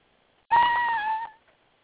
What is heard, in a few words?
cat meow